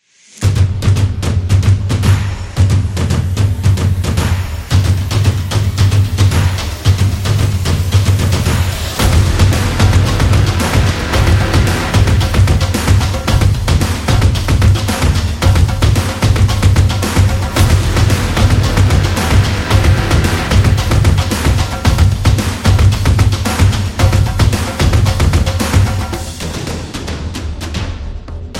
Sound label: Sport Epic Drums; percussive nation vol 3